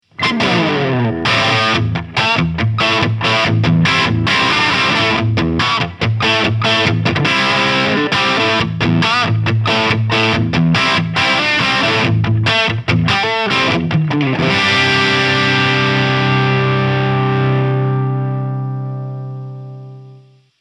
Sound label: ESP Eclipse QM TEASB Duncan Humbucker Crunch